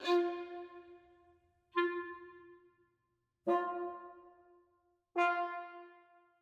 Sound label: multiple inst f5